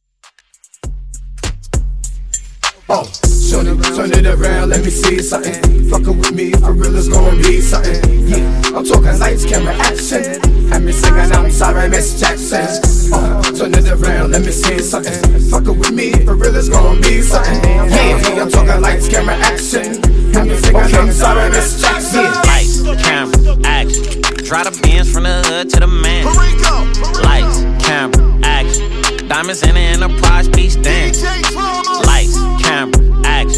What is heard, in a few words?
Hype, 100Bpm; Dj Intro Outro